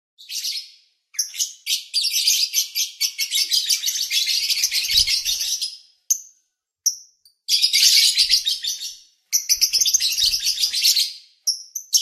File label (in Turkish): cennet papagani kur otusu